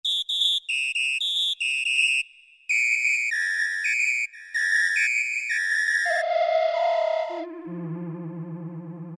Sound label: WHISTLE; Roland SN-U110-02